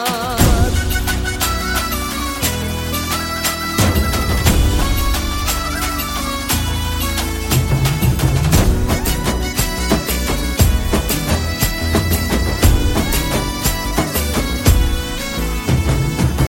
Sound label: bansuri; bhavai